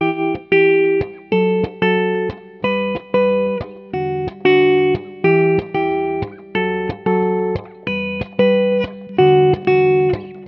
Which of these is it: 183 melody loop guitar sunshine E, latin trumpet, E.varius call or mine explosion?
183 melody loop guitar sunshine E